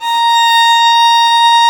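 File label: A#5, violin viola; AKAI S-Series CD-ROM Sound Library VOL-2; VL+VI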